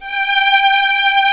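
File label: strings, 8pc; String Master Series; Vlns 2-5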